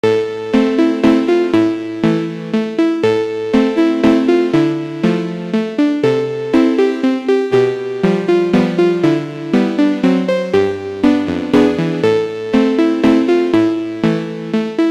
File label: Vals synth; Brahms